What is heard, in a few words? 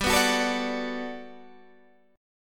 G Major 9th, Guitar, Half Step Down